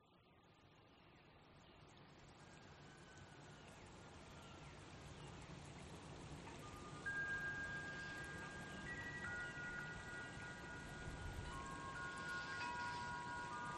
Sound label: soundscape sunrise campsite lying in tent near stream; omar alvarado